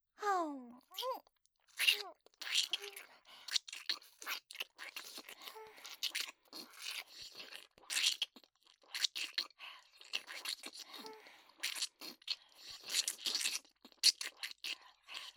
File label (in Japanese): 乳首舐め吸い; ストーリー抜き音声